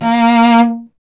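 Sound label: strings cello A#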